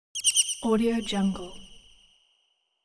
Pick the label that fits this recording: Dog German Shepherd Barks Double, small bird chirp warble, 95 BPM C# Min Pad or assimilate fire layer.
small bird chirp warble